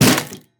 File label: Iron golem damage; minecraft